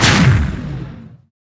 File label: cannon; allegiance